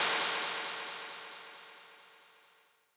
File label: Cymbal Crash